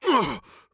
Grunt Hit; Paladins; Astro

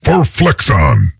attack; Deadlift

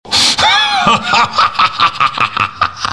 warlock laugh

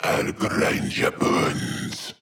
Taking Damage; Lazarus; Paladins

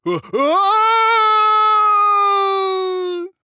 Falling; Koga

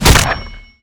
attack; Spirit Breaker

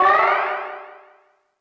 powerup; feheroes

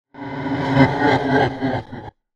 Zombie; Terraria

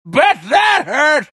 homing missile impact; Gyrocopter

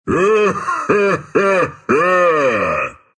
laugh ru; dota2

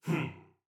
Grunt Fast; Warrior; Alpha; Realm Royale

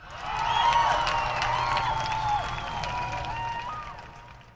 crowd

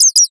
Bat Idle; minecraft

